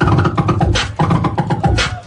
scratch beat; Drum loops

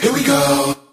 here we go SUNG

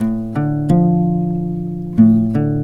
guitar, Jazzmatazz Loops

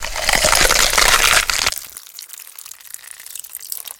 bone break rip off

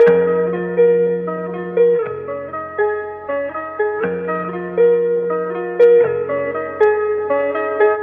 guitar, Jazzmatazz loops

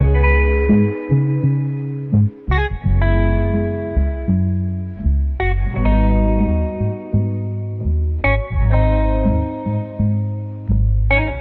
guitar warm, Loops, Phonky

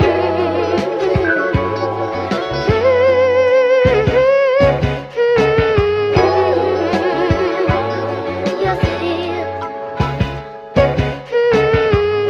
voice horns yes it is; Loops; Phonky

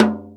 Toms Real

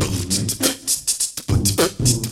dos boxa le beat, Beatbox, Drum loops

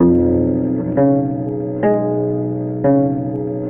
bass, Sad

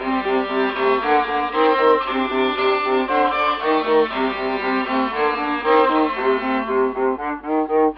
strings, Sad Loops

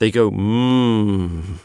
they go mmm

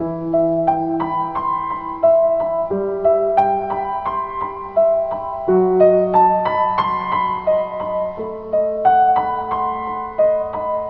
piano, Sad

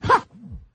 huh 8 bit arcade guy; ooh ahh; heh huh

